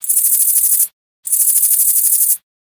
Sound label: Desert Katydid; moul